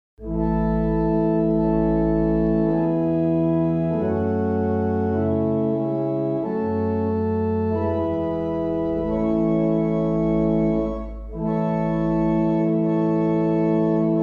barrel organs; Sutton Cambs; Old 100th

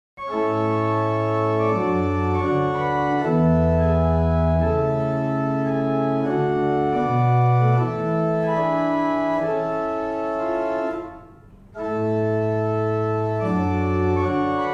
Single chant, barrel organs; Easton

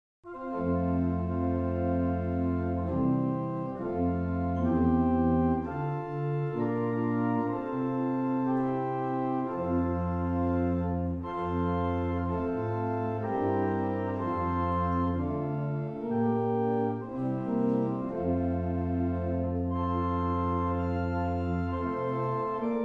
Irish, barrel organs; Stanstead

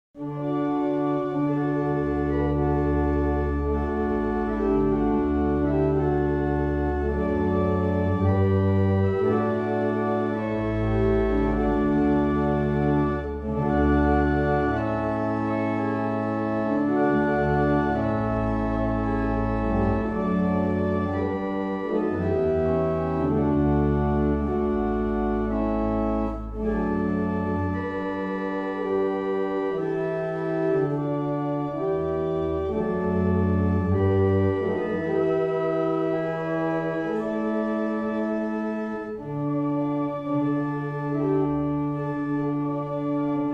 New Sabbath, barrel organs; Meldreth

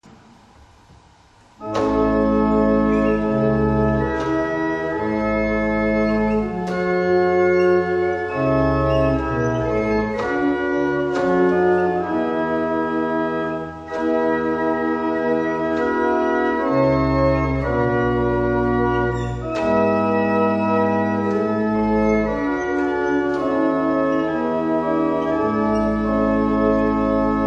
Double Chant, barrel organ; Tittleshall; Soaper

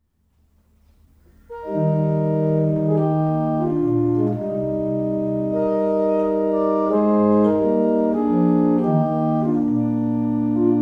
Double chant, barrel organ; Fersfield

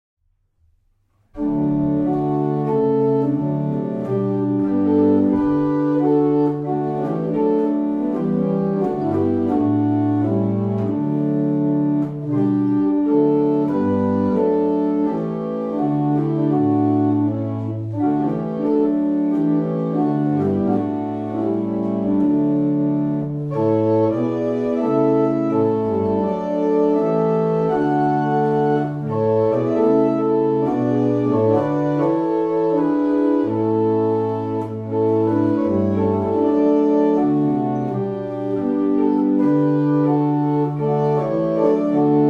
Easter Hymn, barrel organs; Steeple

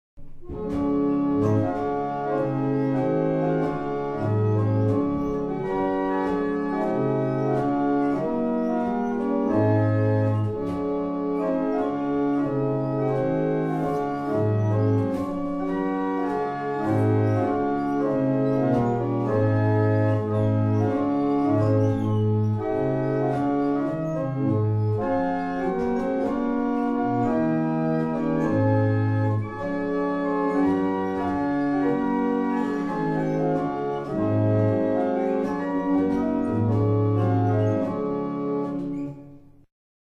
barrel organs; Bressingham

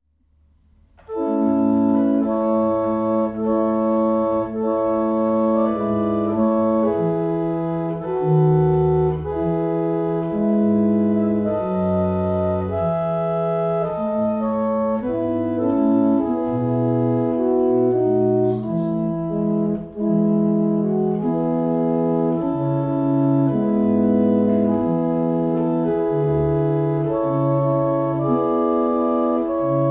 Morning Hymn, barrel organs; Fersfield

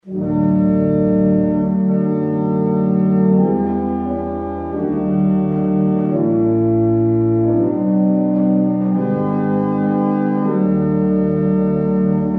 barrel organs; Barnston; Melcombe

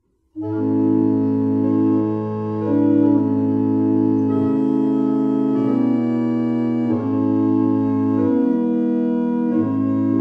Abingdon, barrel organs; Raithby

